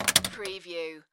Pinball sound, ball positioning multiple clanks

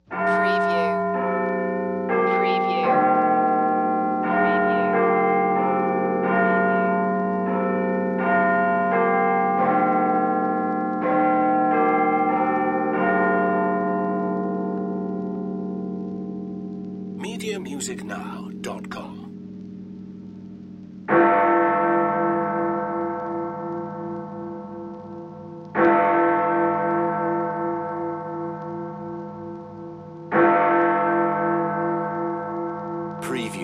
Tower Bell Chime 12 OClock

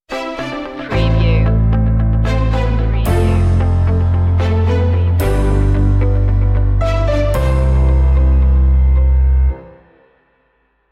happy go lucky stinger

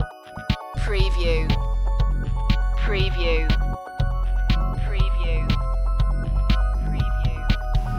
light touch loop

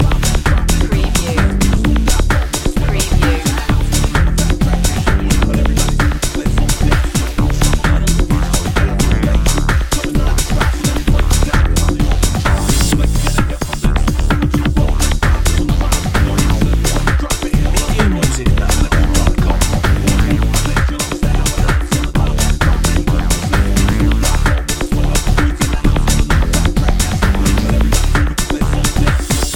power beat loop

